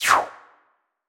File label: Shoot